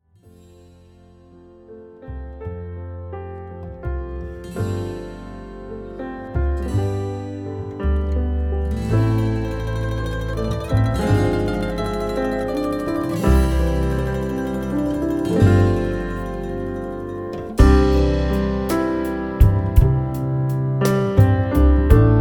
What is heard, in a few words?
Be There Backing track